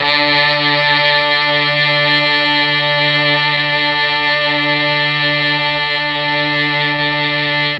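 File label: D4 string brass, mellotron; STRGS+BRASS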